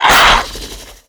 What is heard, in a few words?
attack, antlion; creatures; vox